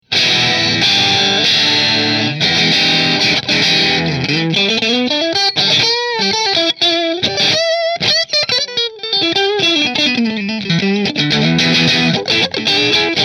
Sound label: Fender Strat Kinman Position 1 Marshall, guitars